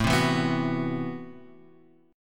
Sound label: A Minor 6th Add 9th A, Chord; Am6add9 Chord {5 3 2 4 X 2} strummed